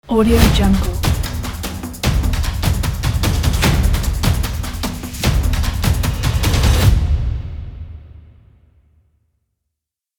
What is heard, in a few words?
cinematic drums intro logo